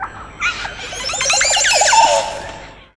jungle; The Wild Thornberrys Movie

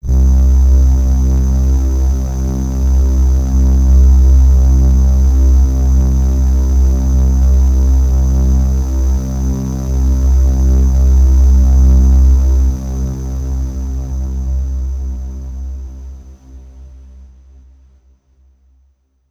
Downtown Overlay Drone

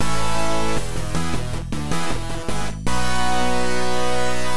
Scene Complete; zombiewood 2d version; Android

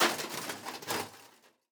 metal break

